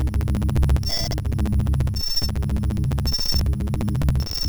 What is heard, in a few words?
buffer fuct loops; bufferFuct samples; miscellaneous Samples